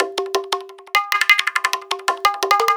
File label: Tabla Effekts, percussion loops; Drum n Bass; KJ Sawka; Drum n Bass & Beyond; Drum Tool Box